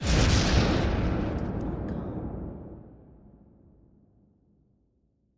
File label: anime explosion electricity